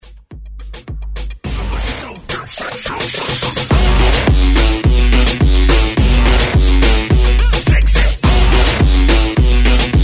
Moombah

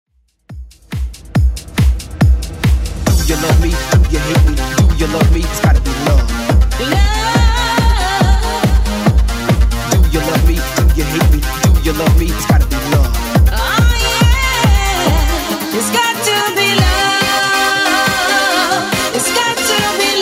90s Redrum 140bpm

